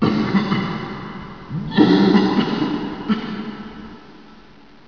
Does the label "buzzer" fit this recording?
Yes